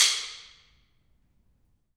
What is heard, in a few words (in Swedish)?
trapphus trumstockar ortf; Impulsrespons trapphuset i Kopparhammaren 2, HT2016 - trumstockar